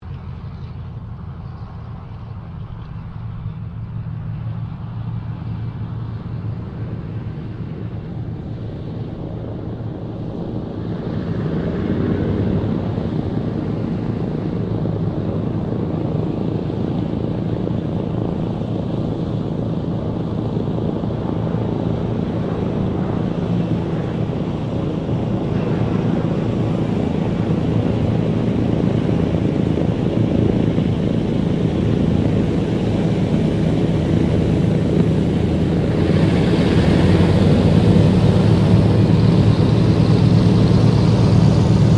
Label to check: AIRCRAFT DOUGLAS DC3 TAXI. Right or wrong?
Right